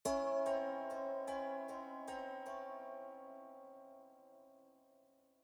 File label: haunted strings tolling